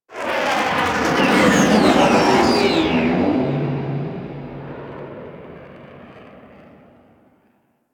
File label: Ship Fly By F; Sentient; Warframe; The New War; Plains Of Eidolon; Mission One